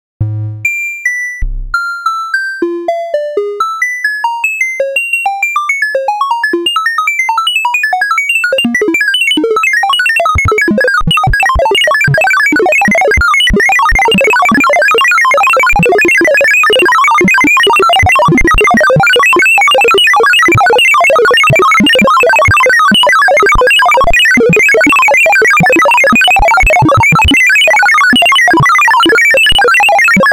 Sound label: Note to Noise scale